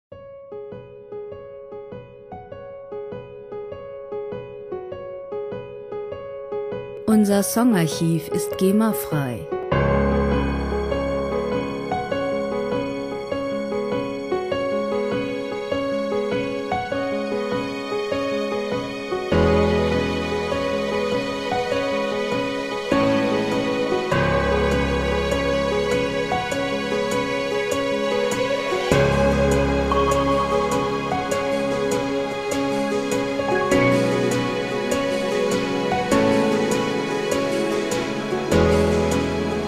slow dance key

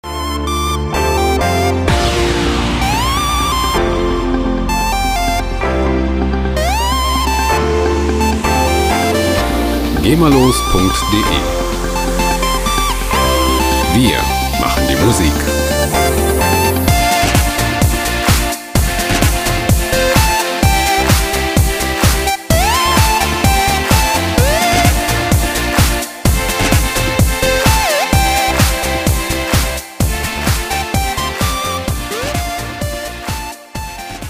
pop

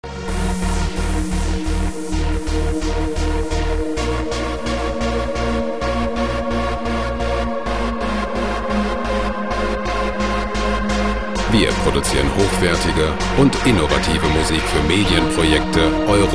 planet trance

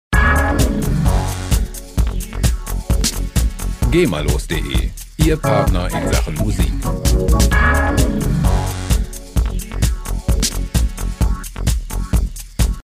sexy house

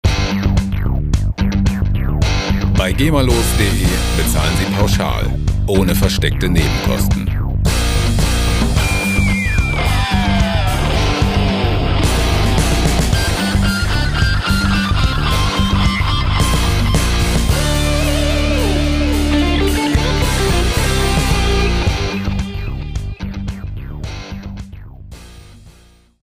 pump up

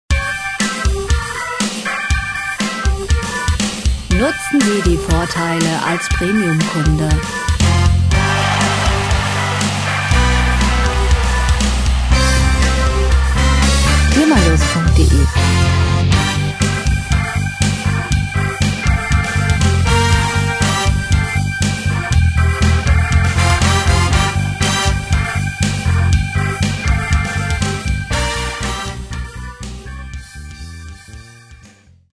hammond rocks

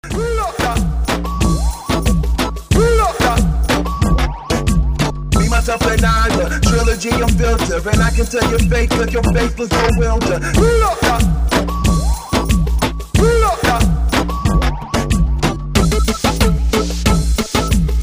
reggae ton

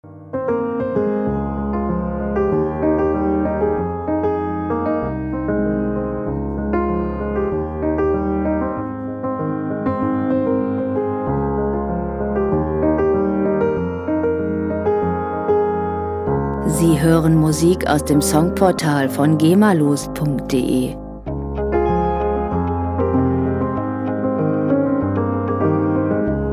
piano intensity

